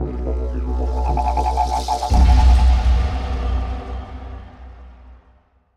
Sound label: Jingle Boss